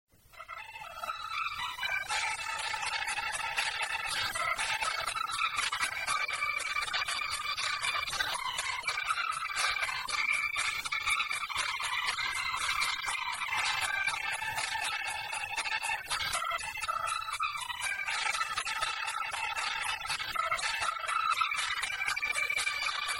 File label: Rain